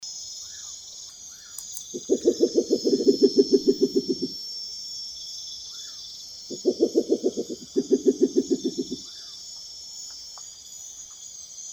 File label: Spectacled Owl